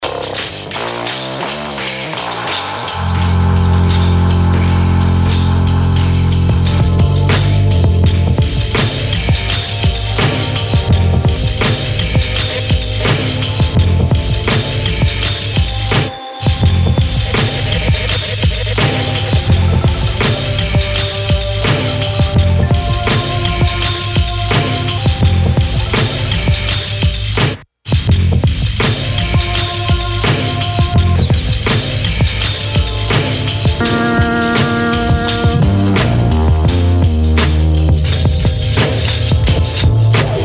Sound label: cave rock